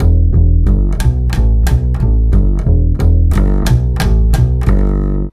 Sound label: Acoustic Bass